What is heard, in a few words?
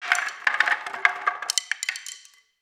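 wood stick drop roll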